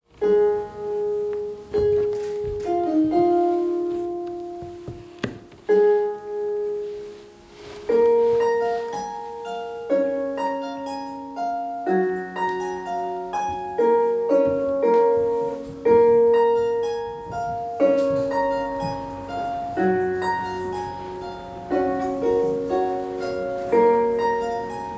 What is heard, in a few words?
Intro Harp no noise